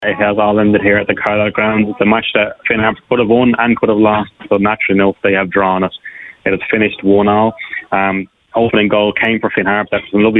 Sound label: harp